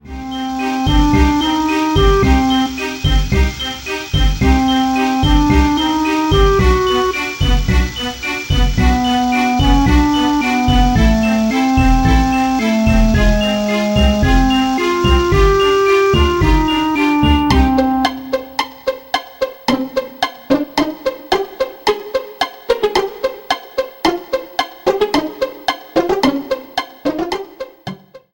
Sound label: Frantic factory